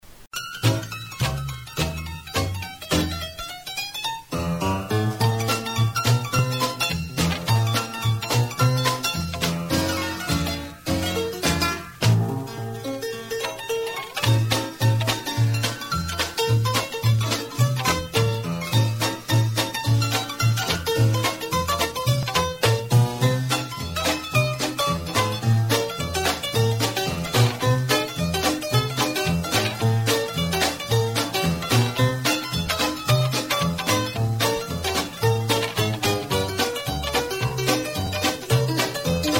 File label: Maple Leaf Rag